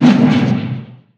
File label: celestial hammer cast; weapons; dota; dawnbreaker